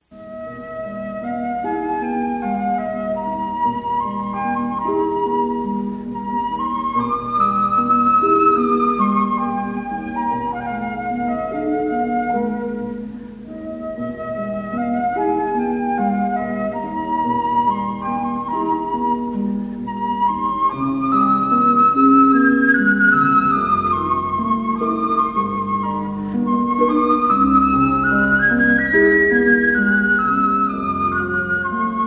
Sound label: flute excerpt with harp